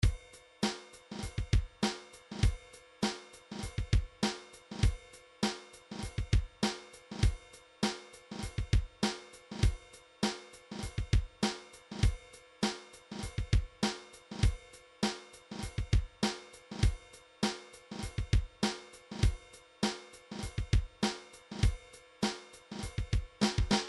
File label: drums 70s goth, midi drums